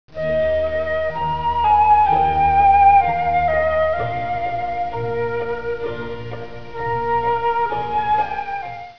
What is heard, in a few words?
flute sound